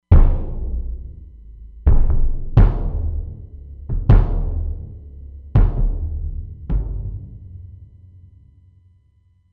Bass Drum

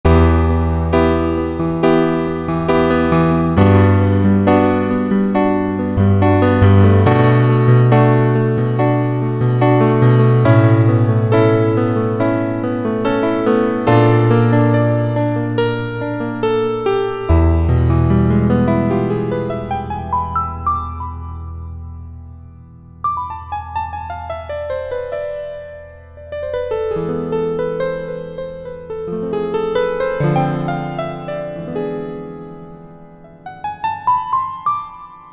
Wash Grand, Stereo Jazz Piano

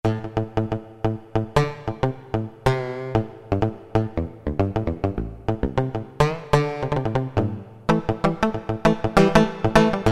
PL Mute, Yamaha TG33; Factory Presets

